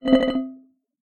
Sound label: notifications; RED; Hydrogen-One